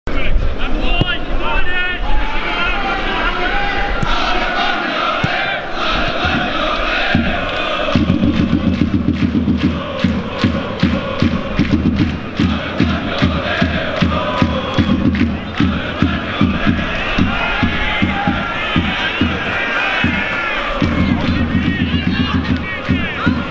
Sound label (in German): Alemannia allez Alemannia allez Alemannia allez ohoooohooo; Aachen schalalalalalalalaaaaa